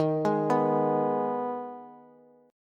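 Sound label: E flat Suspended 4th Sharp 5th, Bass; Ebsus4#5 arpeggiated; JGuitar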